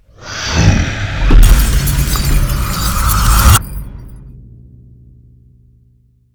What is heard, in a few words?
melee; Necromancy